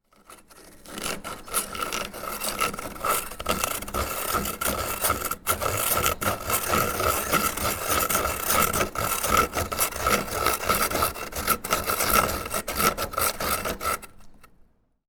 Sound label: pencil sharpening